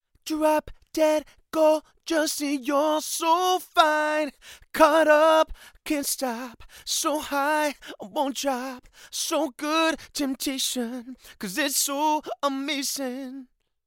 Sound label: male vocals, fet; lewitt